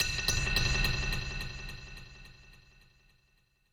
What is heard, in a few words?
Load out BGM stinger, BGM Player Dying Out; Babylon's Fall